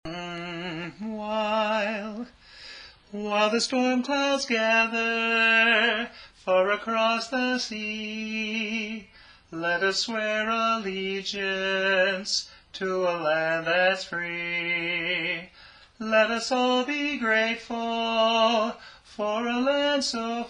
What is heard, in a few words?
Bass